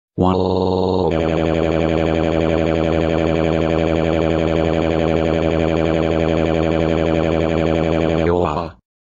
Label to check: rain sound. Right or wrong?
Wrong